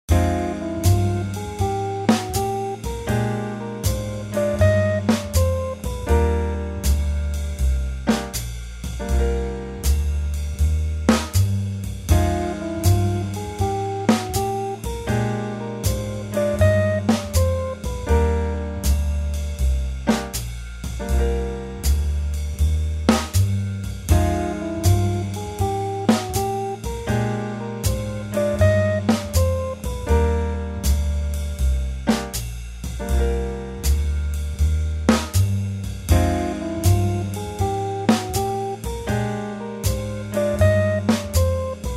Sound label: Scale; Tomosax